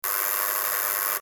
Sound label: failure